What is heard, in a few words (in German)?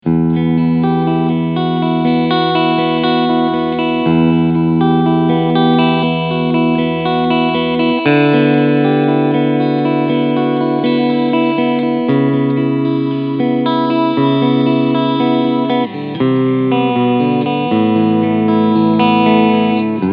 Jackson SL2A MAH Pro Soloist UWH Cleansound beide PUs